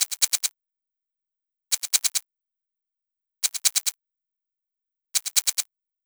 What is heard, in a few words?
140 House Shaker; Dance DJ; Percussion; Shakers; Pro Tools Essential Loops; Digidesign